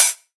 nice open hi hat sample f sharp key, Royality free open hat sound tuned to the F# note.